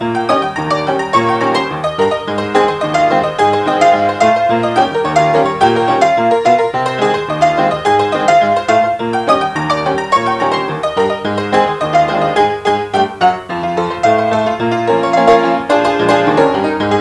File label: honky tonk